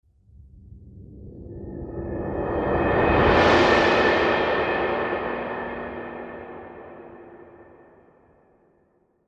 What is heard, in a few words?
Placid Lake Rain Cymbal Roll